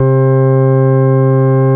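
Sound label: CX3 overdrive C3, ORGAN; Sonic Reality; Vintage Timetraveler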